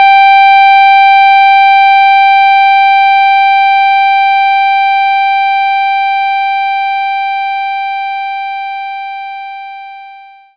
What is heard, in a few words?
clarinet G5